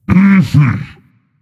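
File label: non verbal agree, voice; cernunnos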